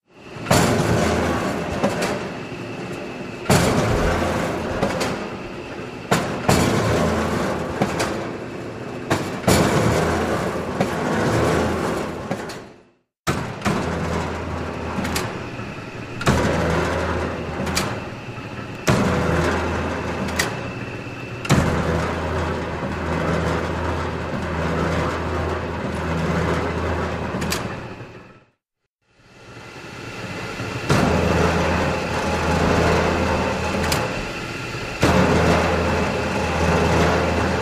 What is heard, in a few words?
Industrial Machine Hy, factory